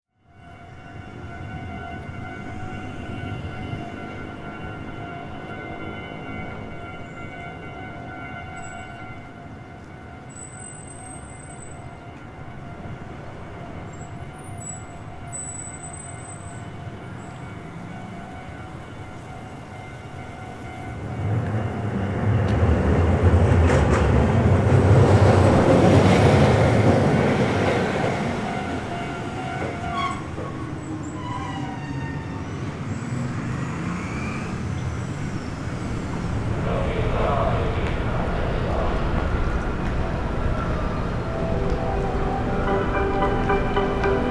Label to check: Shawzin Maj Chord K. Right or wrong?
Wrong